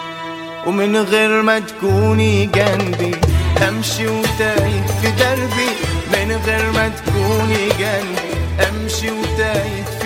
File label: sad song